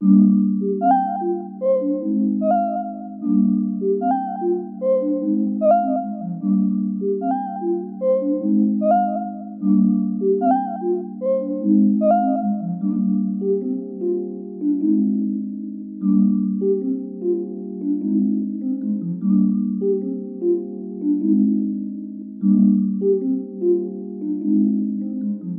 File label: Melody, 150 BPM C Min; Ganja; Wavy